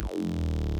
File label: BASS